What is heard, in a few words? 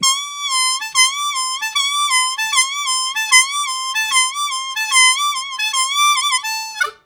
High Bend Repeated, Harmonica; Zero G